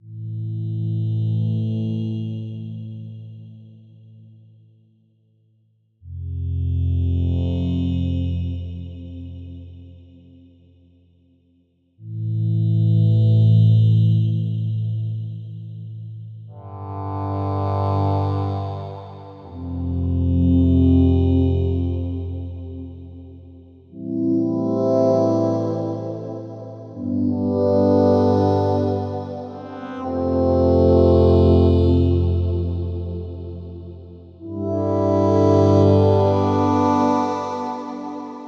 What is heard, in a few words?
Blue Choir; Peter M. Mahr is a musician and synthesizer enthusiast from Austria living in Switzerland.